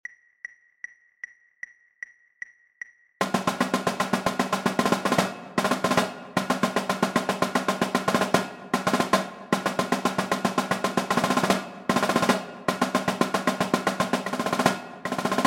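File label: sequence trip, Drumline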